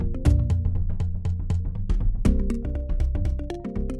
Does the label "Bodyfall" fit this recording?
No